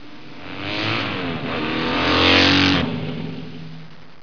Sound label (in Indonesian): suara mobil